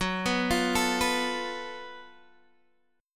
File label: Gb Minor Major 7th Double Flat 5th, Guitar, Standard, chord; arpeggiated; x,9,9,10,10,7; JGuitar